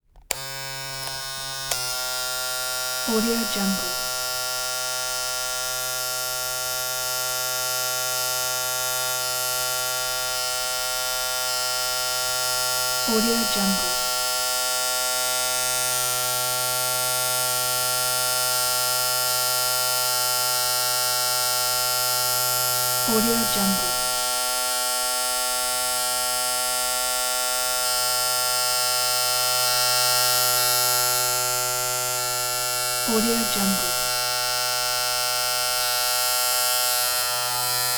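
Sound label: electric shaver close up on run constant handle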